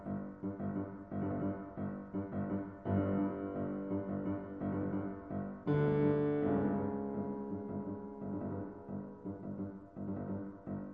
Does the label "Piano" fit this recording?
Yes